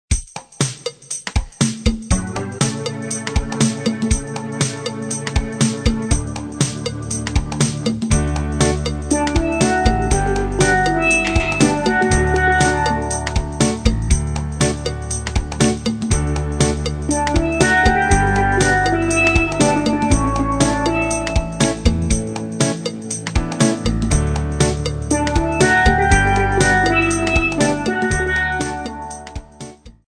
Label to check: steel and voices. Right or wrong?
Right